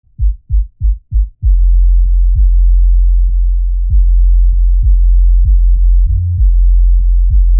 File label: bass